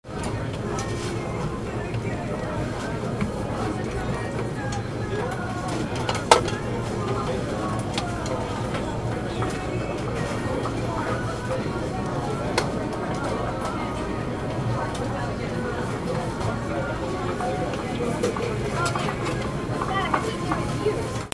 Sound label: AMBIENCE